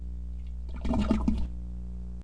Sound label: toilet